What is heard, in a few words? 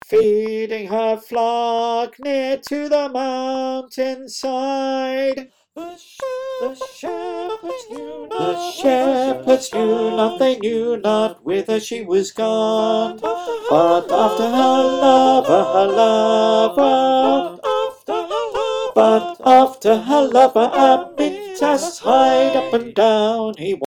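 tenor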